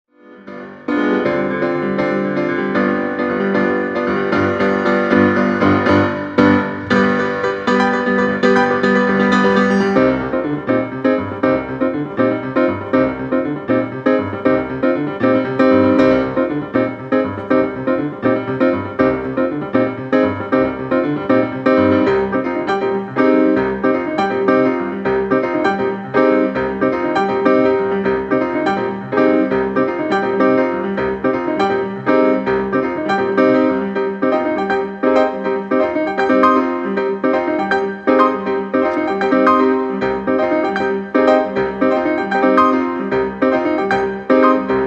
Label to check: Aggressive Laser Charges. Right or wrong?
Wrong